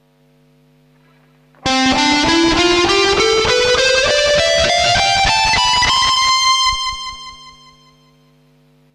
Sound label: some delay